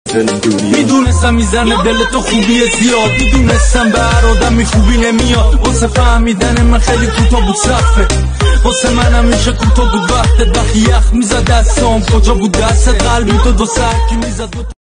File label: Remix